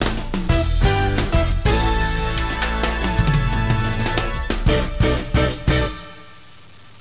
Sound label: Training Success; Mighty Fighter 2; Android